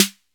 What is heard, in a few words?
DRM 808 SN, Drum Machine; Roland L-CDX-01; Cheese